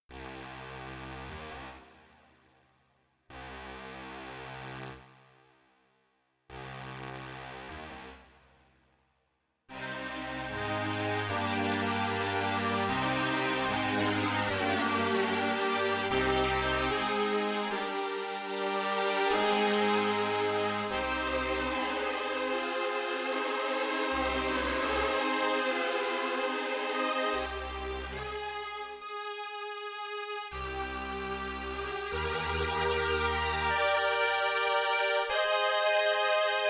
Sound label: strings space